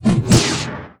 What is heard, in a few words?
Beam Axe, Weapon